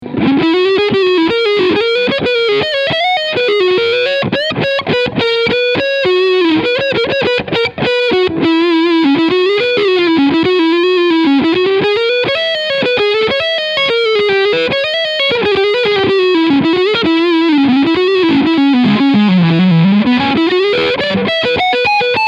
Fusion II HH EB Ocean Turquoise Leadsound Front Pickup